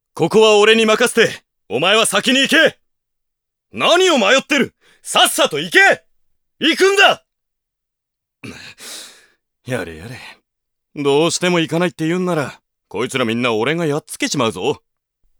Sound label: battle